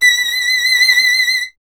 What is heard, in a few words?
STR VLN, Vln Detache; Miroslav Vitous